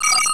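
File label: screen display, computer; federation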